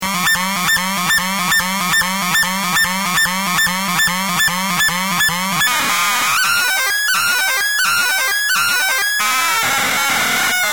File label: laser gun